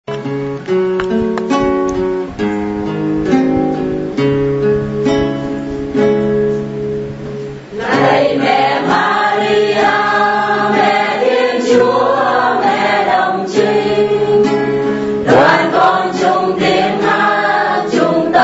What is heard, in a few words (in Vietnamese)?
Bai hat